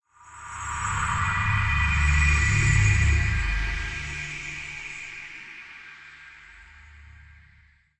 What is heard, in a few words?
rewind Start; arcane